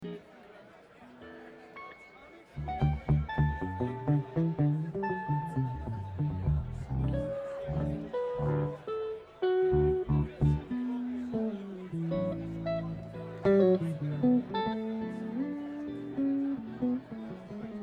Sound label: tuning